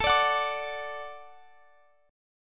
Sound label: D Major A, guitar